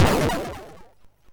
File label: SFX, MOS6581; Narco Police; MOS6581R3